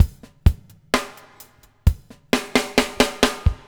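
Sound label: BUBBLE left; Spectrasonics - Backbeat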